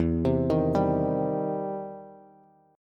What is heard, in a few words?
E Minor Major 7th Sharp 5th, E, down, Bass; EmM7#5 arpeggiated